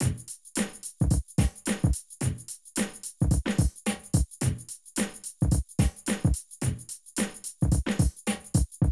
Drum loops, Streetsoul

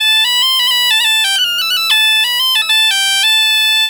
AM BPB, Celtic Flavours, BAGPIP090; USB Soundscan vol.42; AKAI

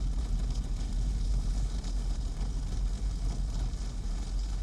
Ambience Loop, outdoor

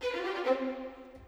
Strings; Sonic Foundry (Sony Creative Software); Orchestral VOL-2; Modern 12

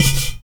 UDU, African; Supreme Beats; Spectrasonics; SB Udus

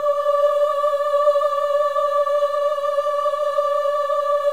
VIB M D5 -L, Symphony of Voices; Spectrasonics

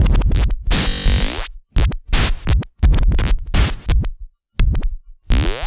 Structured Rhythm, IDM Rhythms

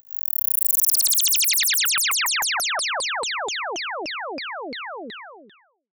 Synth FX

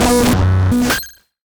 Cwejman S1; Murtle; DevSnd Cwejman S1 - RD

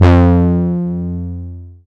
SYN, Analog; Sound & Vision; Gigapack I CD 2

